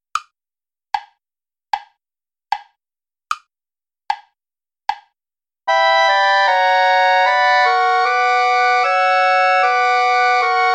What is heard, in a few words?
play along without clarinet